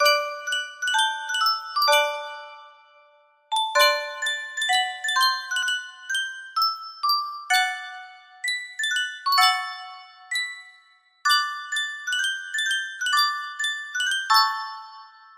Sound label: Reuge music box